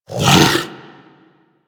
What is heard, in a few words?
Kubrow Vocal Tranq Dart Grunt; Warframe; Hunting Critters; Orokin Kubrow